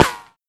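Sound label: machinegun ricochet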